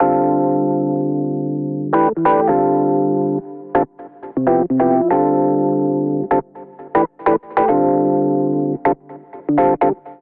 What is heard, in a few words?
Little Amp Electric Piano D Minor 94 bpm; MIDI Clips; Tonal; Accompaniment; Ableton; Factory Packs; Chop and Swing